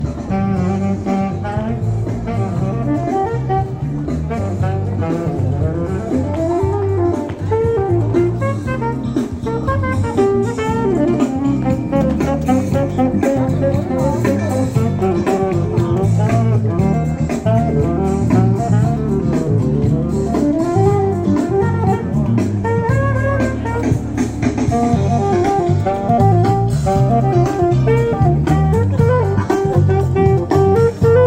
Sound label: sax; zadar